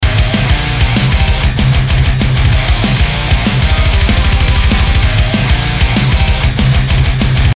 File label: competitor impulse